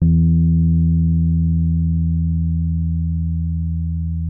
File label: E2, RHODES; Xsample VOL-1